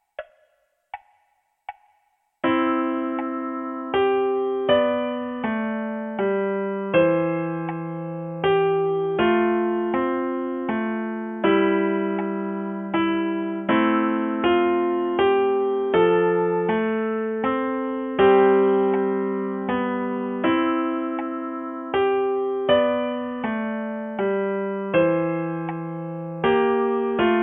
piano unite

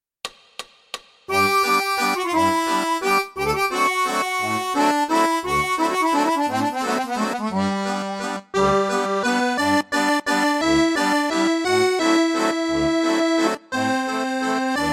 valzer 1 voce; La domenica andando alla messa; folk italy; 8° Capitolo - Accordi di Settima

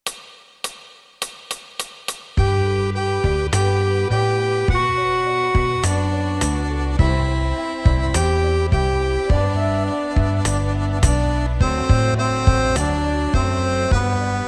Fisa tutti

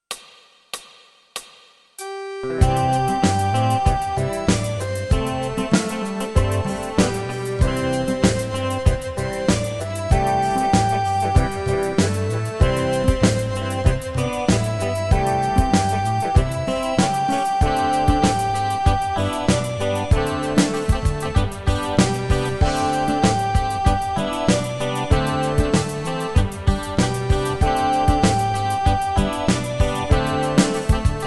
Fisa tutti

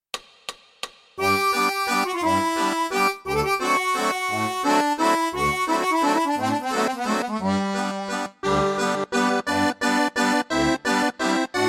valzer 2 voci; La Domenica Andando alla Messa

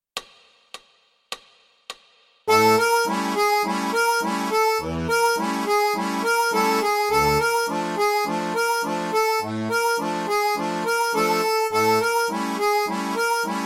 Tango

